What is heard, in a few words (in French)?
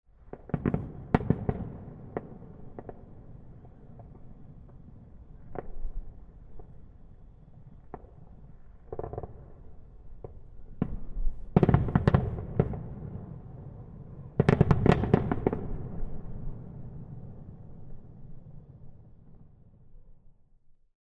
feu artifice bouquet final